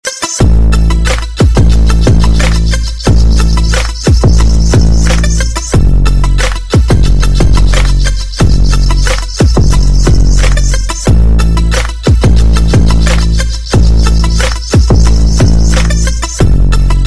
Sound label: power bass, Hip Hop Ringtones; dj